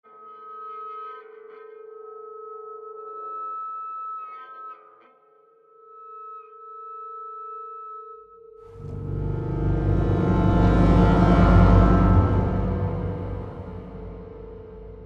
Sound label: Tension Mood